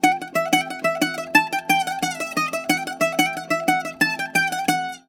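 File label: F#, bouzouki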